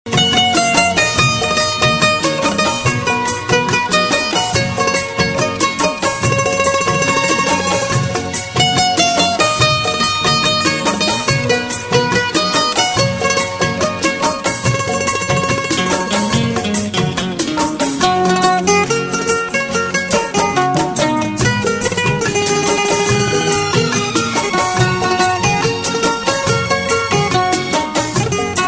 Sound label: soft instrumental; Indian